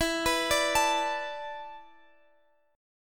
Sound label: E 7th Suspended 4th E down, guitar; arpeggiated